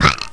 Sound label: Goo death